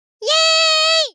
Dance; netent

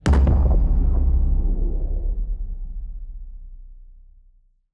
Big Win End; netent

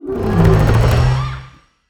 near win quick

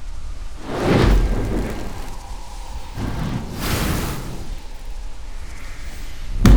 stacked Wilds Main Loop; netent

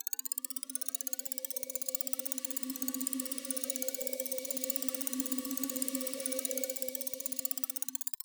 Reel Spin Loop; netent

